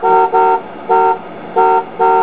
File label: Car Horn